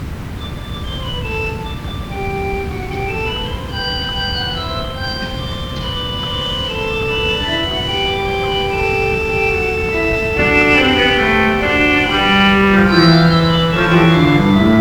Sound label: Trio; Samohi; Abendmusik